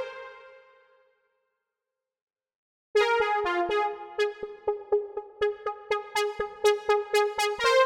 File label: Diva